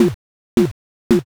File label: hammer